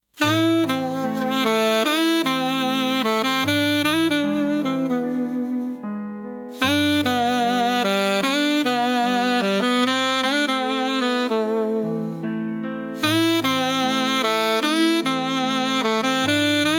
midnight sax

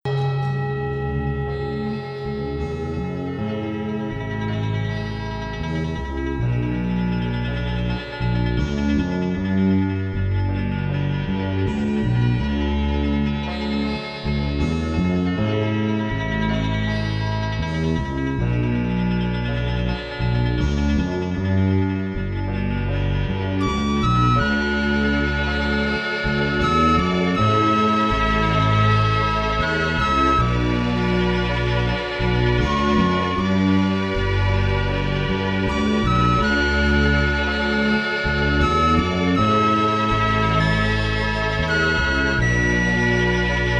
music of the paradise, 432 hz; rodzinny dom; SPA Music

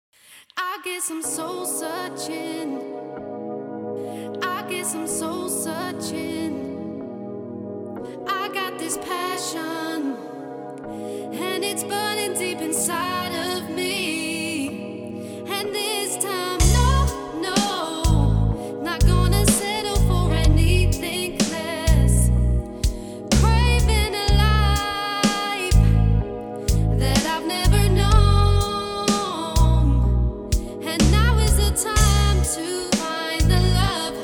Slow Funk Vibes; Spell of Silence

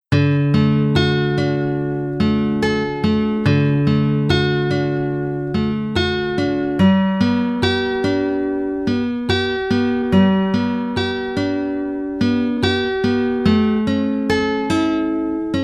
hawaiian guitar, 432 Hz

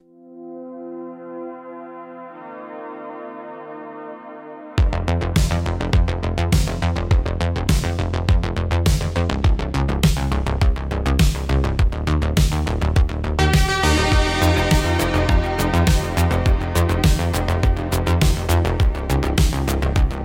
Electronic Impulse

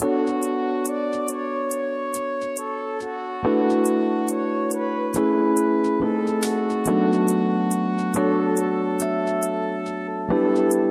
smooth jazz cafe

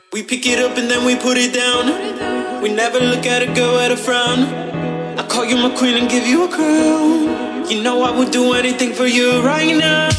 Funky House Vibes

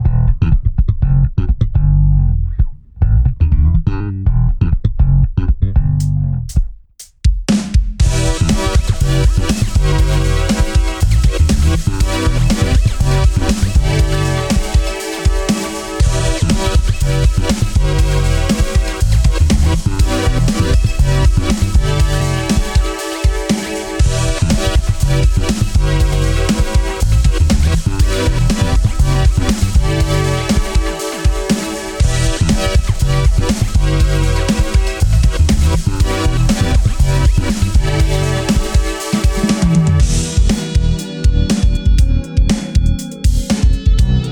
chilling funk, 432 Hz

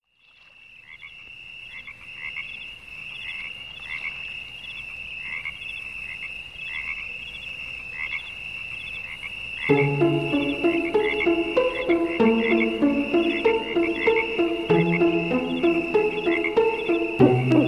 epic 432 hz

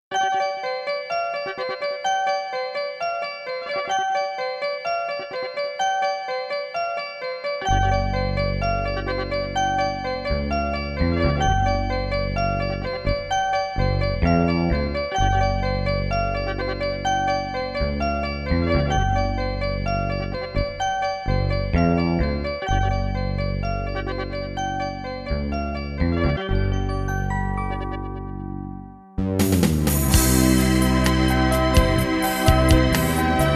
spa music 432 Hz

